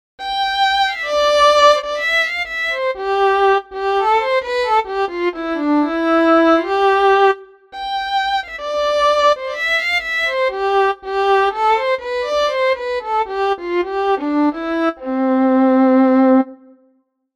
Keltische Geige Fiddle

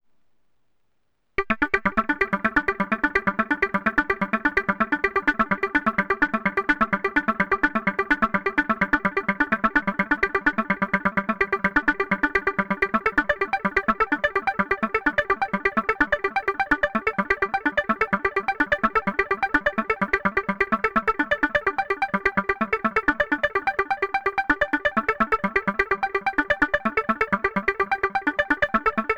Arpeggio Modi; Freds Lab Töörö

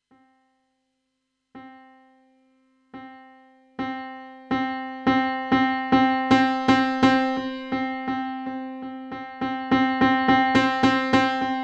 Piano Dynamik

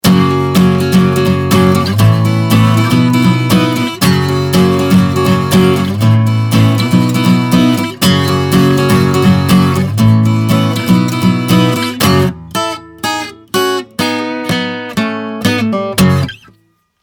Blueridge BR-43 Plektrum

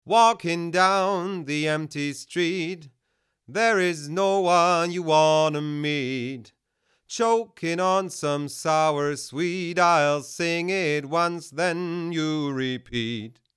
Vocal ohne Kompressor; Black Lion Audio Bluey

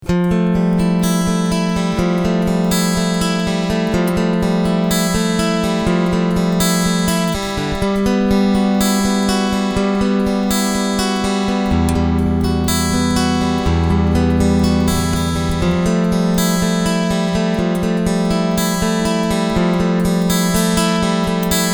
Picking Treble Full Bass 12 Uhr

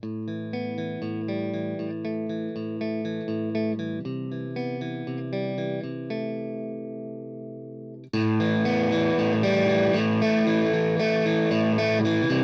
Verzerrer Pedale FX Loop

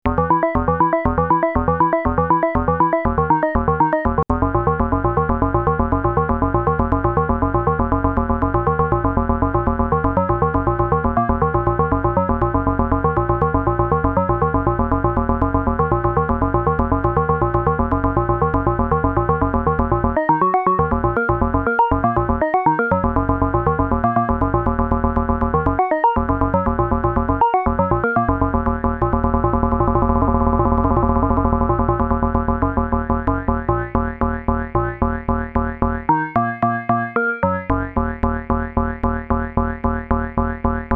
Korg NTS 1 Arpeggiator Einstellungen

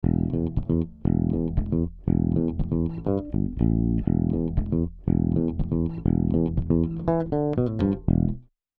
AMS Neve 33609N E Bass 3 zu 1 Moderate Kompression max. Reduktion 5 dB

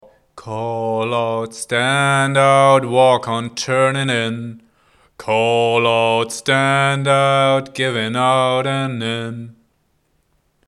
Gesang live aufgenommen

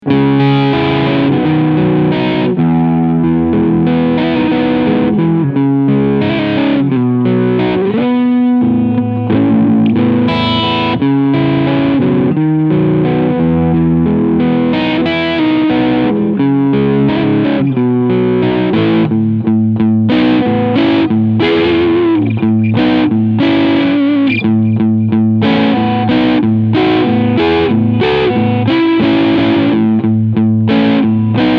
L7 TBL Crunch beide PUs; Larry Carlton

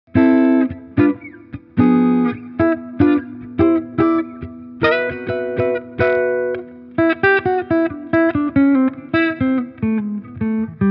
Gretsch G2622T Clean Hals

